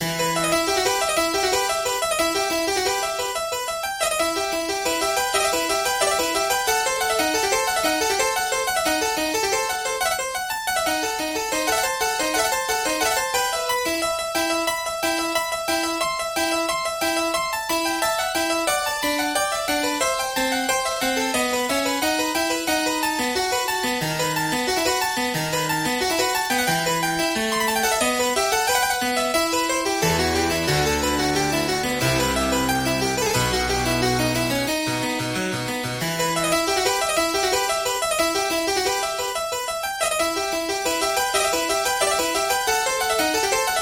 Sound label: Suite in E minor, Harpsichord; Le Rappel des Oiseaux; Jean-Philippe Rameau; Syntheway; RetroMagix Harpsichord